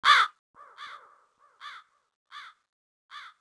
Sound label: winter crow; World of Tanks Blitz; ambient medvedkovo